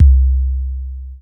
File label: BD, Drums Dance & Groove